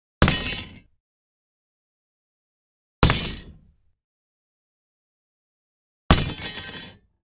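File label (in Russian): sword falls from hands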